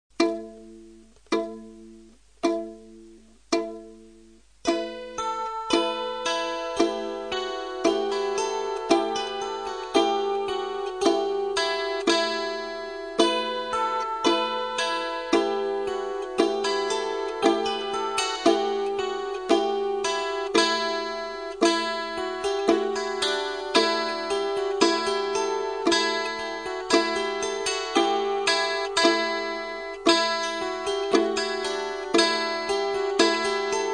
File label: Laulu Jouhikko and Kantele